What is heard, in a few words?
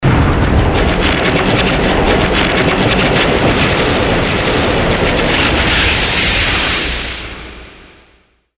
static storm; skbt astro mothership